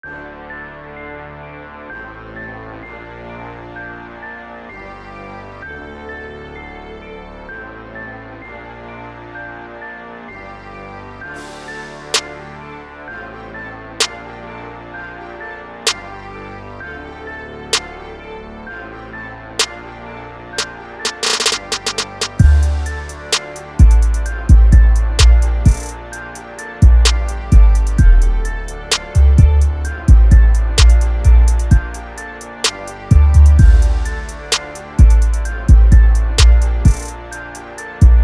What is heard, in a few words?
Instrumental